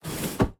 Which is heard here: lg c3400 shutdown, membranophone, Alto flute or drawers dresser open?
drawers dresser open